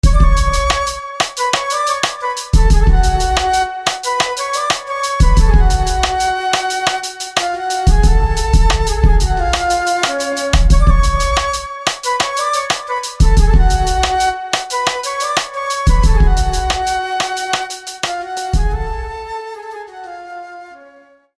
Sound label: Flute; Soothie; SFB FX